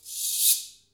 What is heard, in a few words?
SHAKE, SHAKER; AKAI S-Series CD-ROM Sound Library VOL-5; SHAKER.MARAC